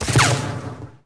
lightsaber hit

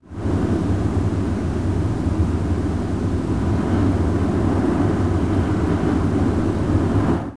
wind med; Star Wars Obi Wan; XBOX

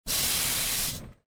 steam blast; Soldier Of Fortune II Double Helix; XBOX; ambience

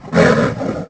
roar

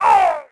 Hit; Star Wars Knights Of The Old Republic II; XBOX

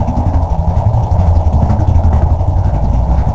roll metal future; terrains; Tony Hawk's Project 8; XBOX

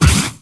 Ptwang Miss; Finding Nemo; XBOX

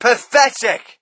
crowd, shout; Mike Tyson Heavyweight Boxing

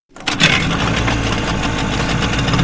Boat Start; Vehicles

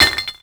bouncy glass bottle collide; bouncy objects; Tony Hawk's Project 8; XBOX

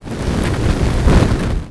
fire

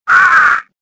death

